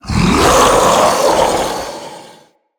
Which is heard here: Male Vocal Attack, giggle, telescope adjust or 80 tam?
Male Vocal Attack